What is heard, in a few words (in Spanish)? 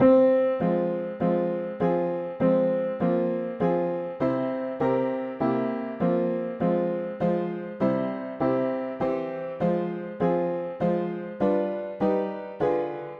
mono piano; hymns; Himnario pequeno